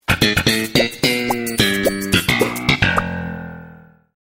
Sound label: Sitcom Bass Riff